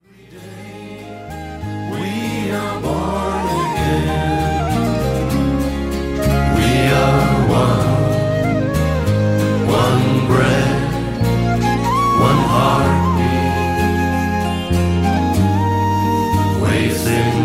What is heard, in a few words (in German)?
Gesang